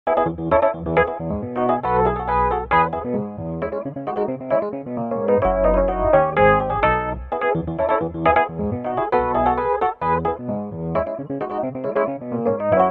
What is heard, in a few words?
nord stage